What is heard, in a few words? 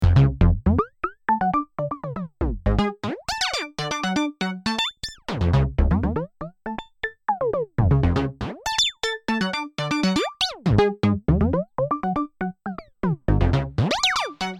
Interpolater Sequenz